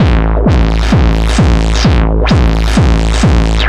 Analog Crunch; Loop Samples; eurotechno

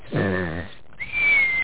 Snoring; Master Clips Email Animator

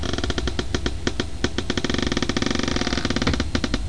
cautious, scary; ClickArt 300,000

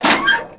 open trash; Star Wars DroidWorks

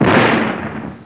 EXPLOS; alienbreed3d2

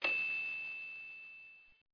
typ bel

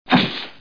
poof; Bible Fun Pack; Education Publishing Concepts

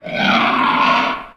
enemy kill; wob2 demo

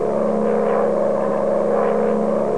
plane; Vrtulnik

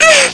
Ooh; HyperCard; FontAnim1

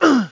hero hit; alienbreed3d2

